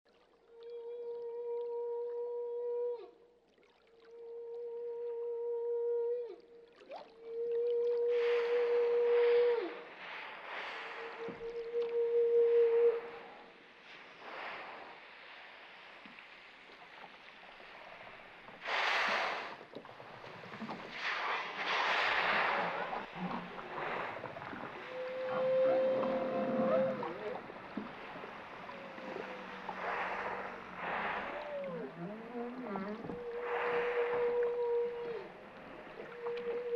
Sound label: whale symphony